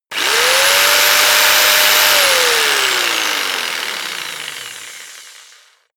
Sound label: jigsaw engine fast long; maschinen; saege; soundpool